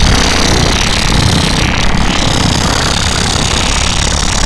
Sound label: fly, antlion; hl2